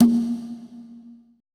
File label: CONGA L; BPC DRUMS